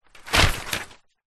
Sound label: paper sheets many pile drop on floor